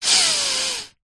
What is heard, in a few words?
human male middle aged single breath in nasal nostrils